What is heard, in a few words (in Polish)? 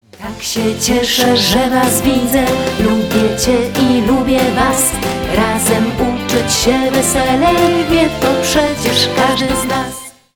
Piosenka na dzien dobry wokal